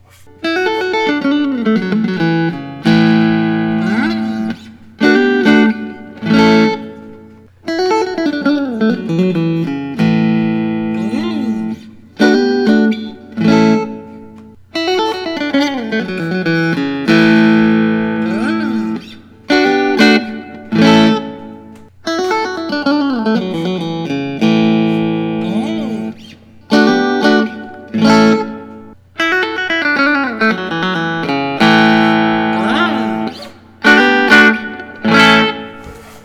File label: Fender custom shop; greg fessler masterb